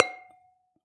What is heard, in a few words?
Tap Glass, Medium Wine Glass